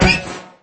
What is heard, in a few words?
interceptor fire; jedi; lego star wars